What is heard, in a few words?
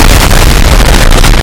explosion; beepteebops